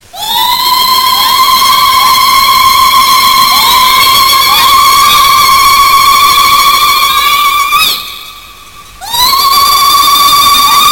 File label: Scary Tribes